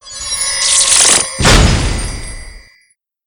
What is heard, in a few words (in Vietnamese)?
Tieng no khi dung ma thuat phep thuat